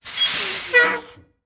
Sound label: Bus Air Brakes; sound-effects-bible